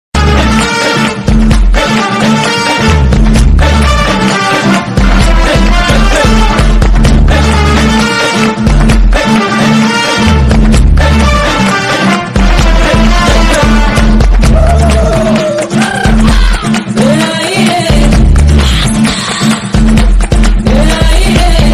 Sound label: movie ringtone; ayan